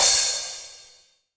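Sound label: cymbal, real mega drums; Best Service; Real Mega Drums VOL-1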